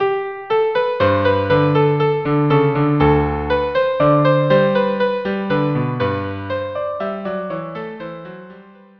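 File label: viola cello duo minuet; KV 487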